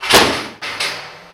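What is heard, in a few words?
CAGE SLAM 90 BPM; MACHINES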